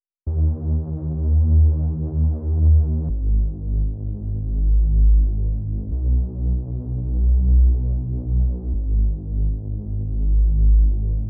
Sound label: 170 BPM D# Min Bass; Loop Stems; Cymatics; 2022 Melody Collection Platinum Expansion - Pt 1; Doomed